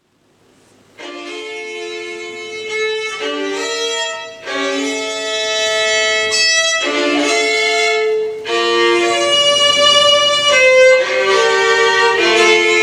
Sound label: Ciaccona, il violino; la ciaccona di bach e il violino di zeno